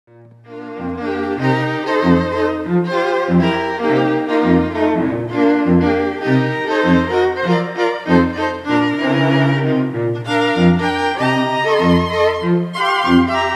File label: String Quartet